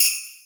Bell, Boss DR660

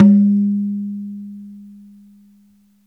dhum, mrid

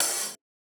open hh; eddyflux

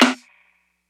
snare; dough-samples; eddyflux

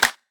clap; eddyflux; dough-samples

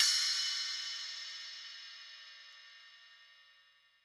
ride; eddyflux

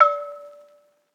perc; eddyflux

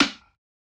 snare; dough-samples; eddyflux

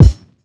kick; eddyflux; crate bd; dough-samples

